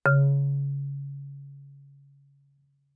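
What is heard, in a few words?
Marimba cord fortissimo Db2; MIS Pitches - 2014; Percussion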